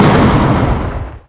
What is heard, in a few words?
Explode